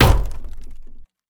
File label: Door Break; MiSide